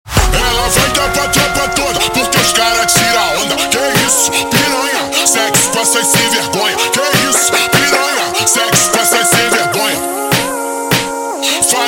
Brega Super Slowed; funk

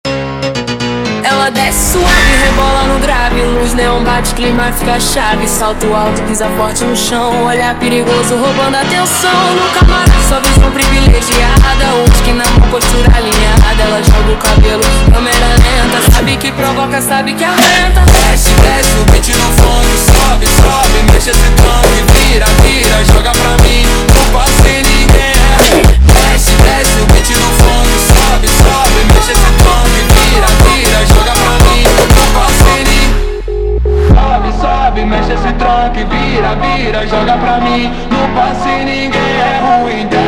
slowed, funk

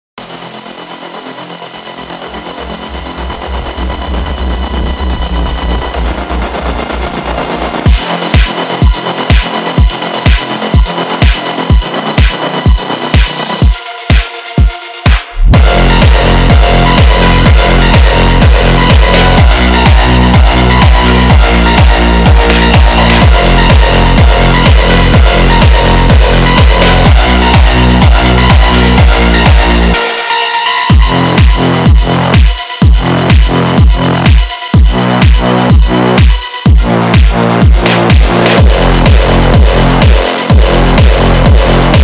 funk

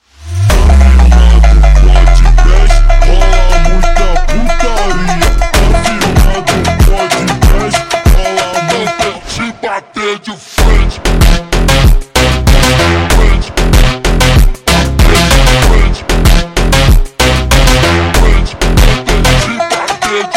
Montagem slowed, funk

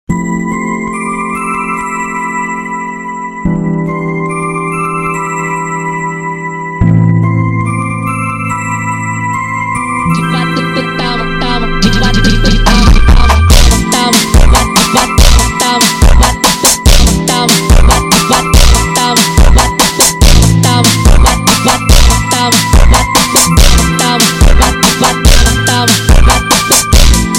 Funk slowed

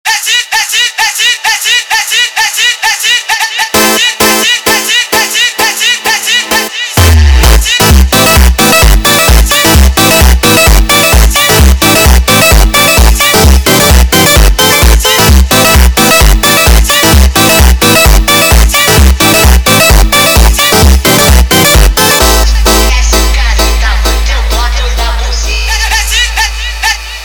funk

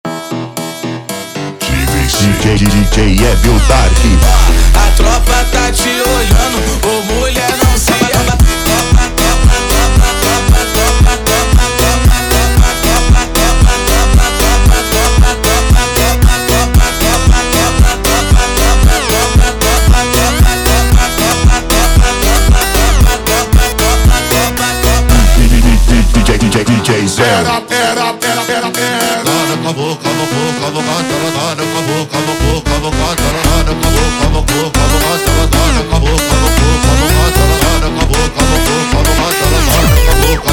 Funk Zuado slowed

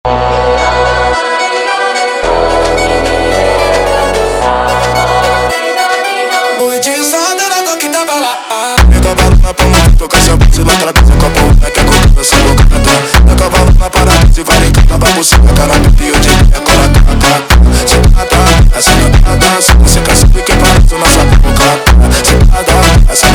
Funk Risada

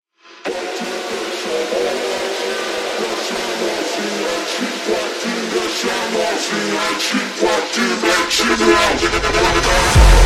super Slowed, funk

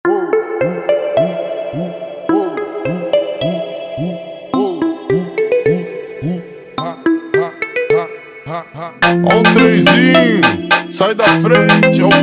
Funk slowed

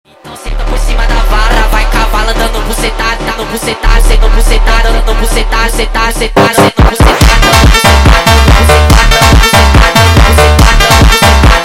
Montagem Sped Up, funk

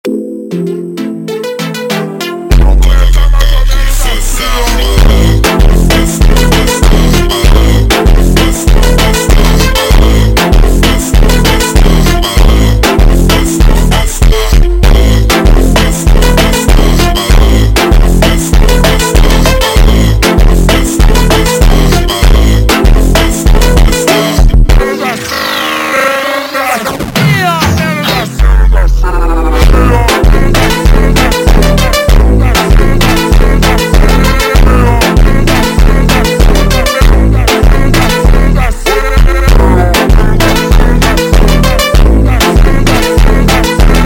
Funk, Super Slowed

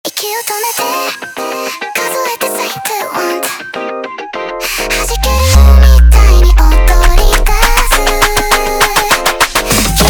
Brega sped Up, funk

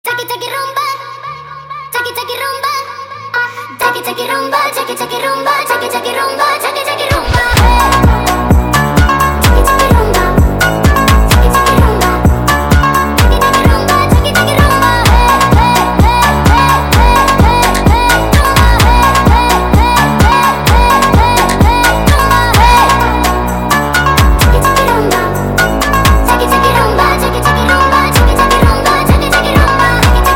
sped Up, فانک

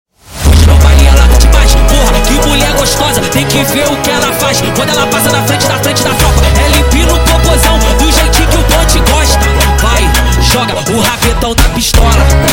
Brega sped Up, funk

